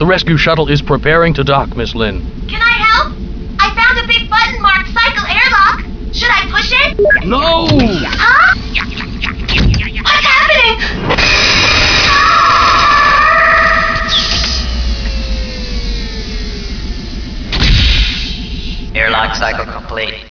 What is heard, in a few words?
Airlock